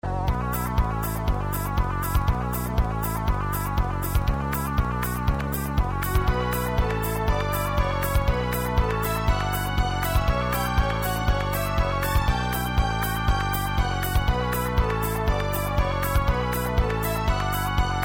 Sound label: techno; techno wave